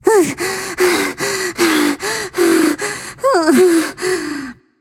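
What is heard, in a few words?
nonverbal breath; smite; voicepack; izanami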